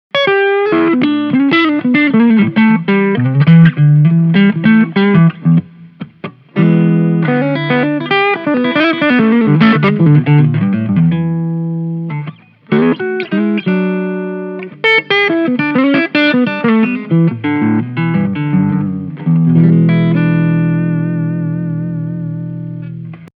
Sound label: Boost CREAM